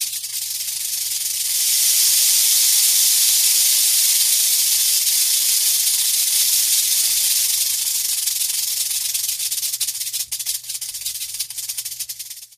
Rattlesnake Tail Rattle

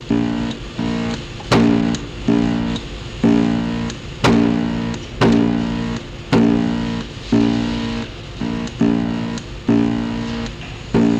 Magnet Coil Hum; buzz & hums

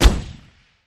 Gun Shot Single Silenced

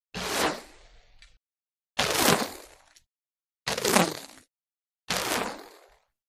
Serrated Flufl Arro, bow & arrow

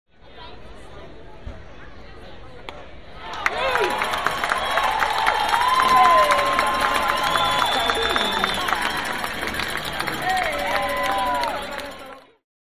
Medium Baseball Crow

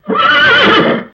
Horse Whinny Neigh

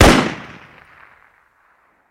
Ak47 Sngl Shot

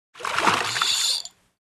Fish Reel Strike Line, fishing

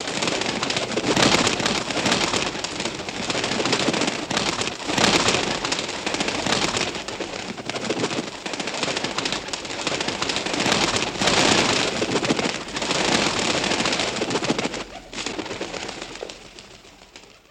Birds Flying Away, wings

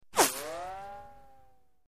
Left To Right Tonal Bu, bullet bys

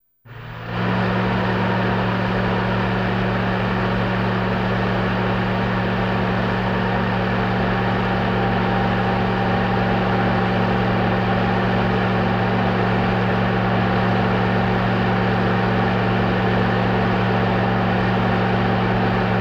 Slide Projector Fan Only

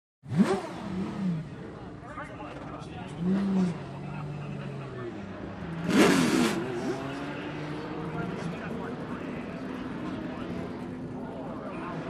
Sports Car Race Pit

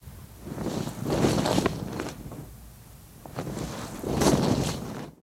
Blanket Flaps Multi

